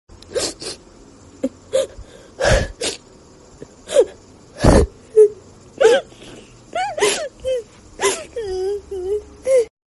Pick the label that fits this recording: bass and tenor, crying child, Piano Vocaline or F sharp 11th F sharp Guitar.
crying child